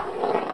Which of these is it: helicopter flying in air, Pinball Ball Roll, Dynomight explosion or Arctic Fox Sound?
Pinball Ball Roll